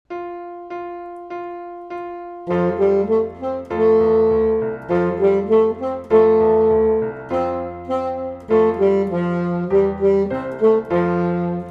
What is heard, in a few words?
Suzuki Volume 1 Alto Sax The Finch